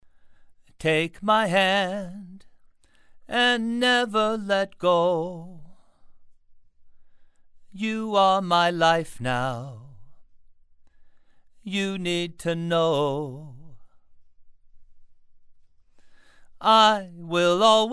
Male Vocals Gemini 5 Tube

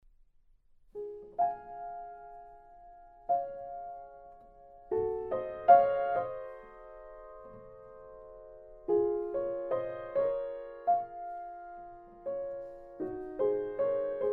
Piano

